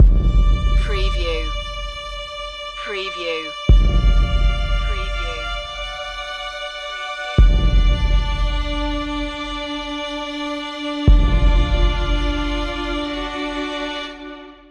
dark afterlife loop

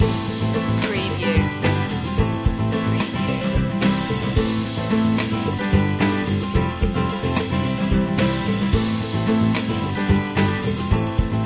simple happiness loop

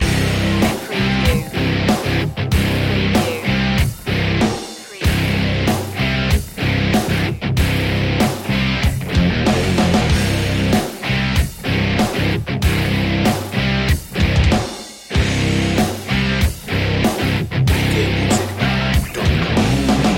attack of the guitars loop

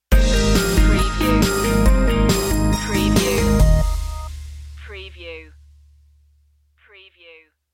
electronic stinger

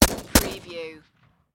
Machine Gun Sig SG5

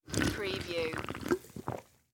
Drink Slurp Pop Can; Sound Effects Bible

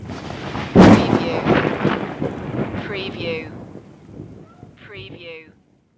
thunder crack loud

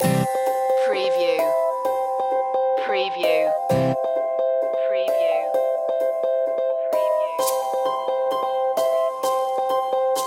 the quirky traveller loop

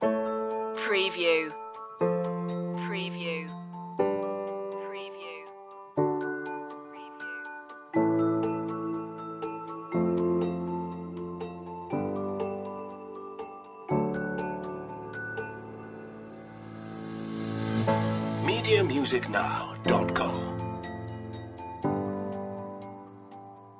bell shoppe underscore

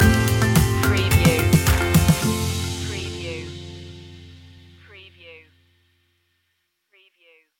taking flight stinger

